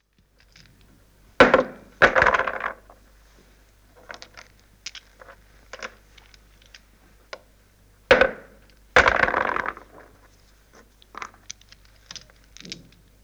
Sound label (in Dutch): bikkelen